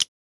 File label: decision, button